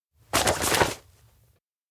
note hide back; anemoi

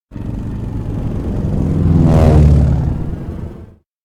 elec outdoor plane approach; anemoi

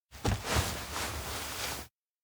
tatami sit; spica; anemoi

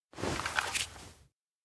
bike model pick; anemoi

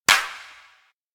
high five; anemoi

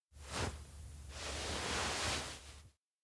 turn to slow; anemoi

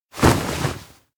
surprise; anemoi

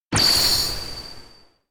wink; makoto; anemoi

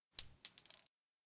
konpeito drop; anemoi

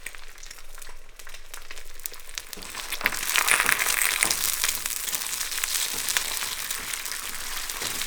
omelete; anemoi

